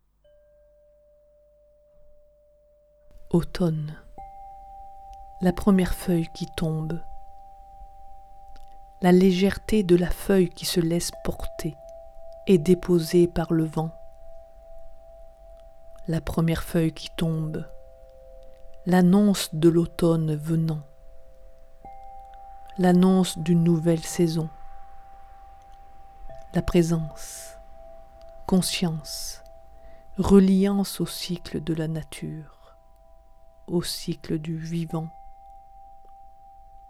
automne et harpe

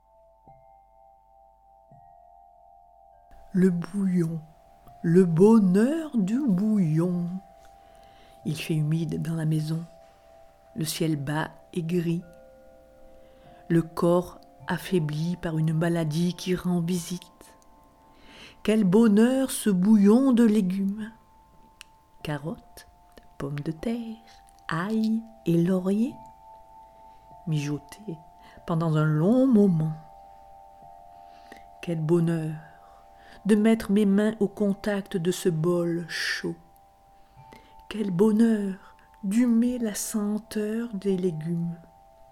harpe

